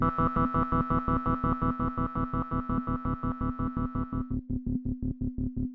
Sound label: E pulse 86